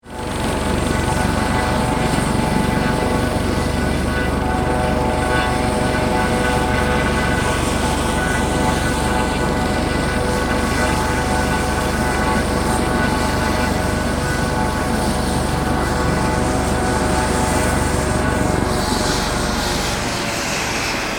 Helicopter Off, Aircraft, Helicopter, Shut, Off, Hh-65, Dolphin; Transportation; Helicopter Sound Effects; BlastWave